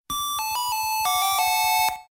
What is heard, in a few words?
MAV signal szignal